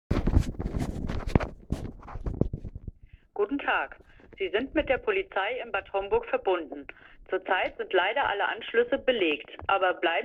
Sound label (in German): warteschleife